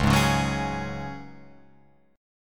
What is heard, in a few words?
Db Suspended 4th Db down Guitar Open F, Dbsus4 chord; strummed